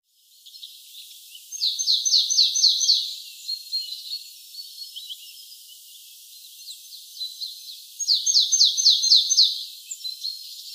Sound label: Poecile palustris; SETE